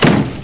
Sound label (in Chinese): 关门; 日常生活; 特酷声音素材库